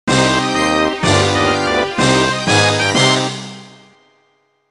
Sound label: Final Door Unlocked; Banjo-Kazooie's Great Adventure Complete Sound Version